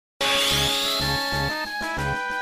Limit Break; Final Fantasy VIII

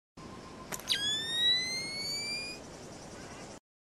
goggles; Sam Fishers

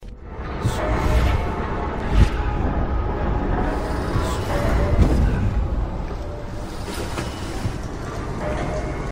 Blink; Dishonored

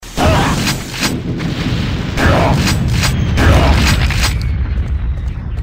Super Shotgun; Quake 2

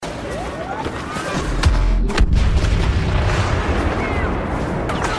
Thermal Imploder; Battlefront

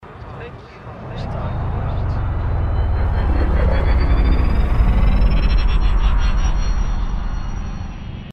Sidewinder Engines; Elite

